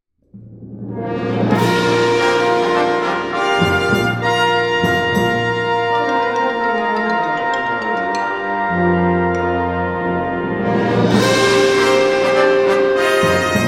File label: Ta-Da Fanfare